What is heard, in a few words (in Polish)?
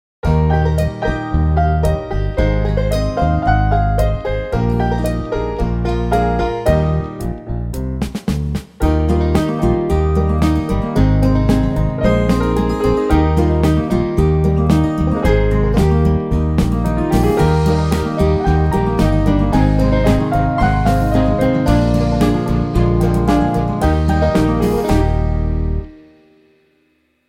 plas bez wokalu